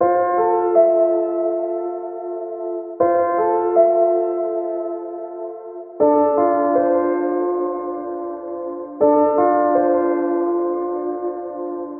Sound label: Wood Piano F 80Bpm; Granular; Lo-Fi; Granular Musical Loops; Ghosthack Advent Calendar; Lo-Fi Kit